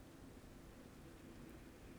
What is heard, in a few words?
pulses, BALAENOPTERA; MONACO 2022-07